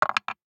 DICE on Wooden Tray, Throw and Roll, Metal, 1 One Die; Samples; ArticulatedSounds; DICE Sfx Party (Extended)